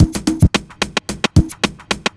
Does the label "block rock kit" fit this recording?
Yes